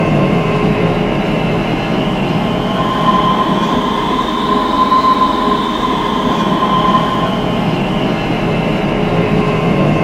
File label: Motor, train; OpenBVE